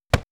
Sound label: Hit, Hong Kong Action Kit; NoizBoy; Hit Kit 1